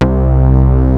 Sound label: SPLIT; Rubber Chicken Software Sample Bank